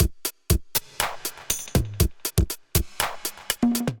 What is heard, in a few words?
Kit, Drum Machines; LD Blowout Kit; Ableton; Factory Packs; Drum Essentials